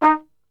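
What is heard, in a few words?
D#2, flugelhorn, brass; Best Service; Brass Super Section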